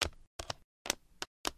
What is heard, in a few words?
drop few tiles; RS Games Sounds; Shut The Box